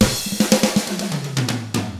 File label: ROCK